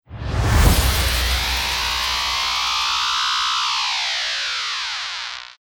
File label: deathcam